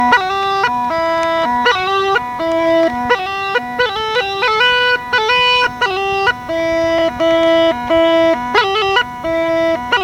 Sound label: song, Rodope Gajada